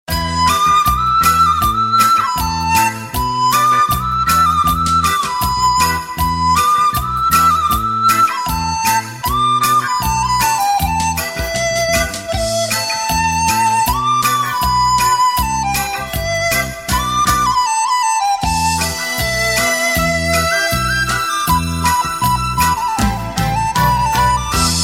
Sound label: Chinese Ringtone